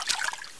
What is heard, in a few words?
player slosh; Group Mapping Project